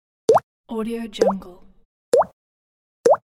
drops

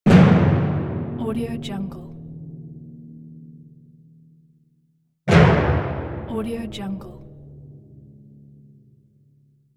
hard timpani

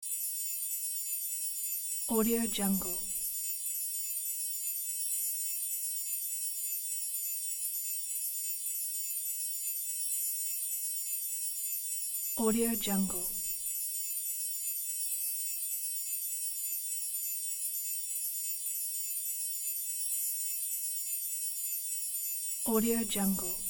fantasy mystical wind chimes

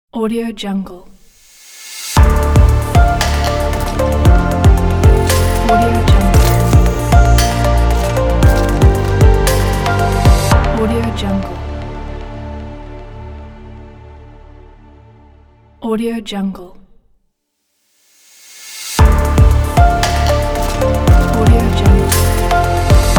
technology logo

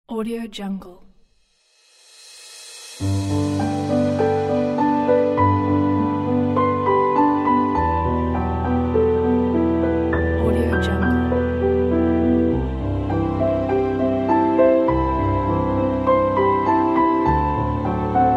calm piano and strings; michaellerm

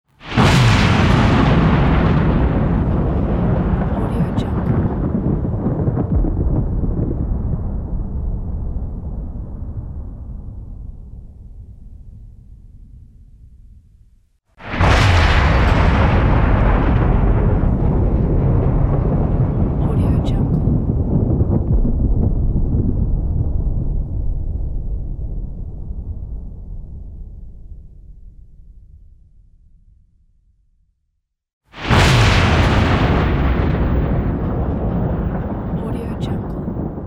thunder

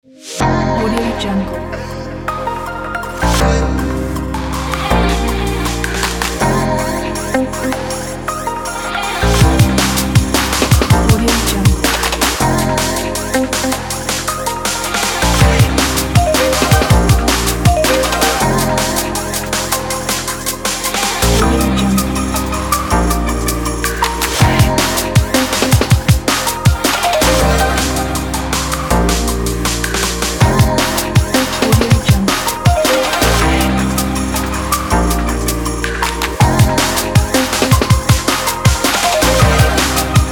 motivational drum and bass; reproductionmusic

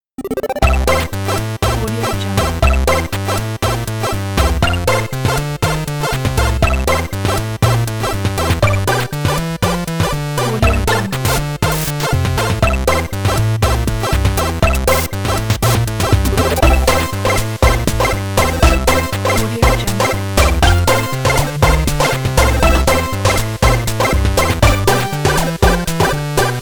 8 bit arcade intro logo

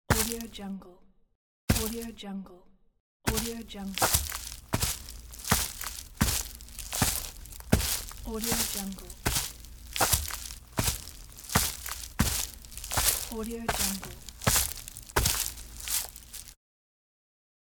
footsteps in forest

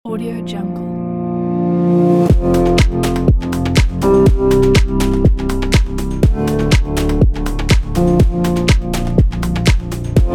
summer clear bright coporate

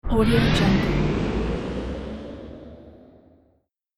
future teleport

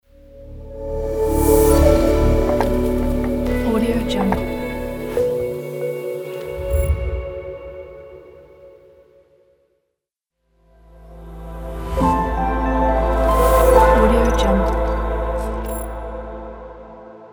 logo pack

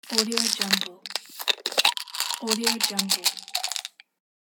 taking a pill out of the bottle container

